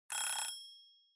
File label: Kitchen Timer Alarm Short